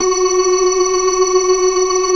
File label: FAST VIB, ORGAN, TREMOLO VIB